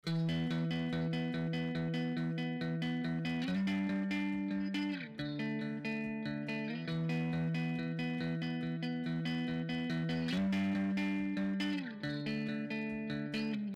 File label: Distorted Clip